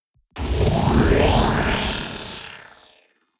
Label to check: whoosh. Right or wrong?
Right